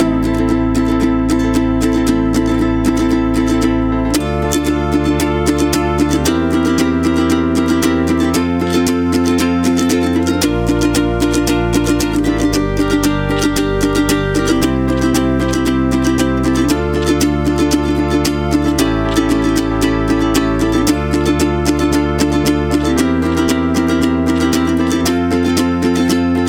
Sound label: guide track solo full 115bpm no lead